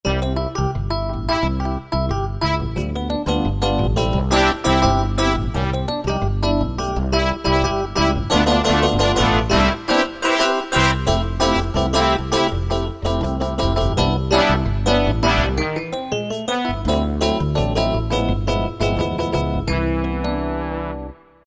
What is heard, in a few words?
Latin Band, Korg 05RW